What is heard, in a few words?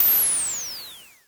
Dual-Percussion-Synthesiser; Klone